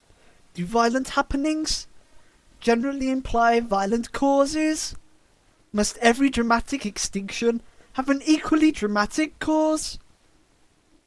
autotune

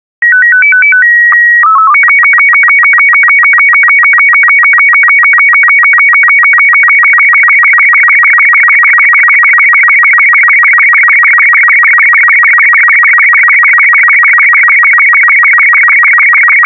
SSTV Robot36; SSTV Logo Wikipedia

